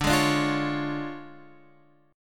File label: D Minor Major 7th Flat 5th, D